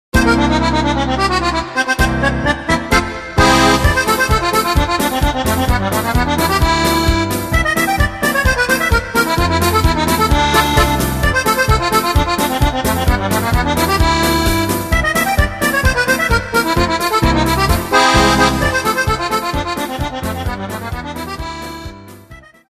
jazzi polka

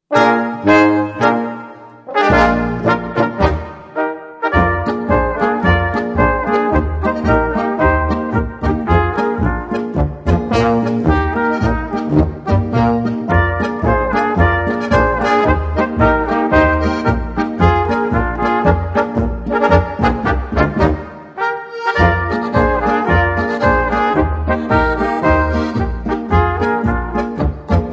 polka

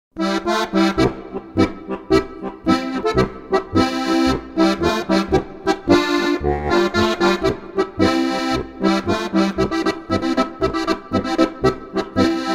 polka

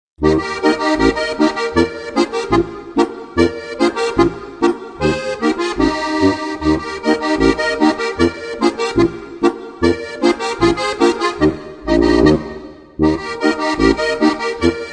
harfen boarisch

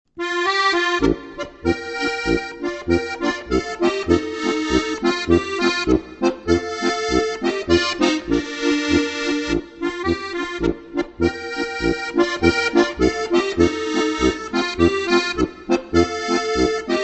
marsch

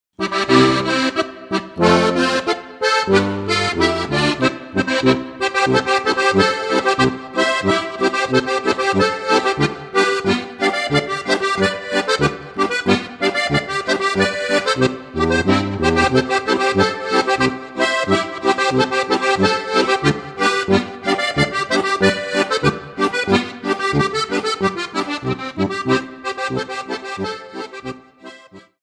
boarischer

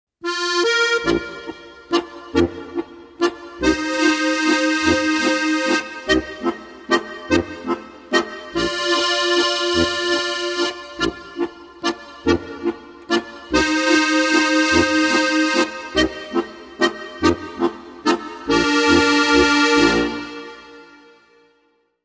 harfen walzer; Volksmusik- leicht gemacht

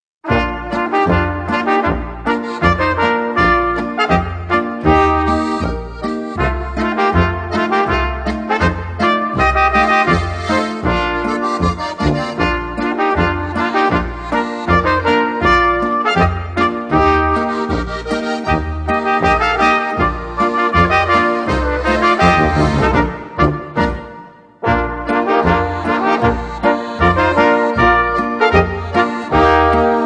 boarischer

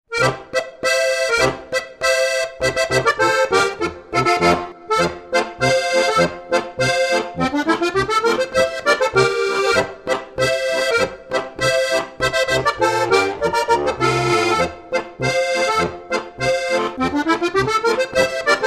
boarischer

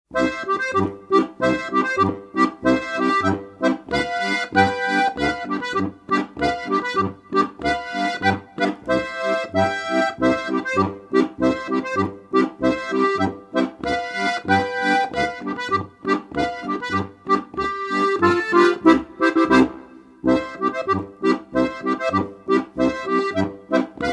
polka

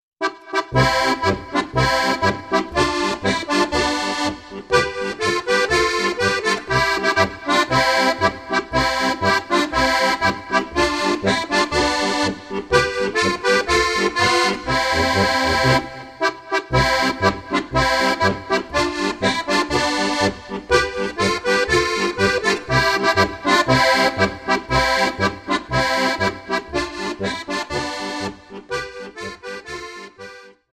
polka

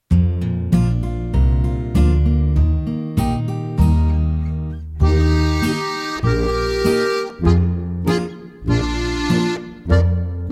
ennstaler polka 3 knopfreihe; Knöpferl Geschichten 1

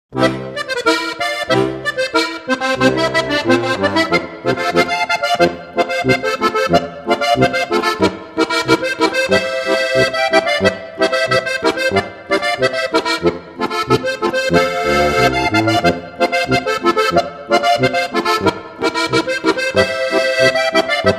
boarischer

